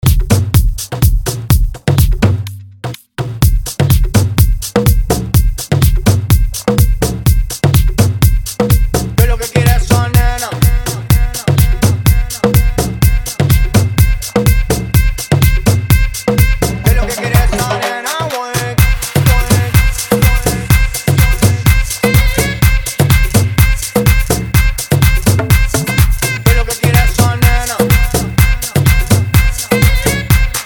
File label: Guaracha